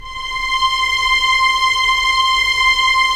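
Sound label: Strings Violins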